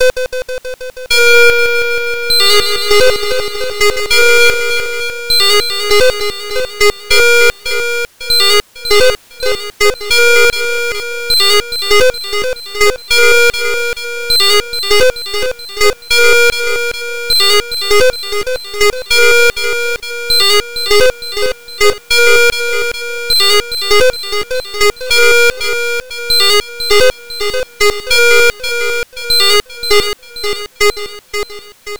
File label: triangle comb